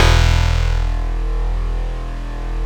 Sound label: PERC BAS